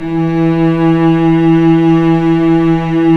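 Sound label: strings, mf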